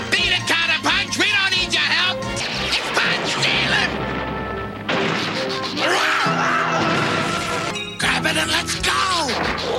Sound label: pounce